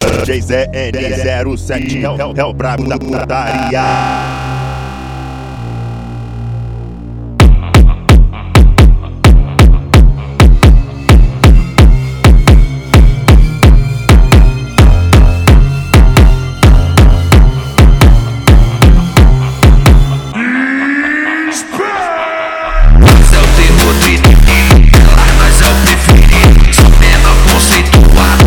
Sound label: phonk